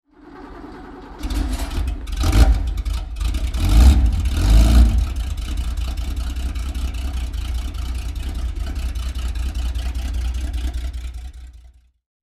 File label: Porsche Carrera RS